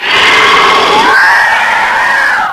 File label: hunter die; hl2